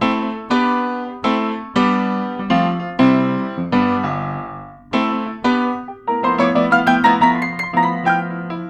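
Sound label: Upright Piano stereo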